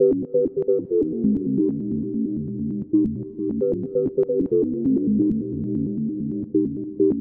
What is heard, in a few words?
bassy organ techno lead a